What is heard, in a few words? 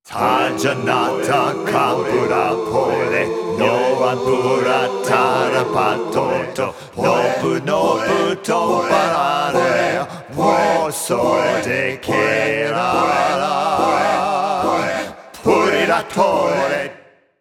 Acapella Version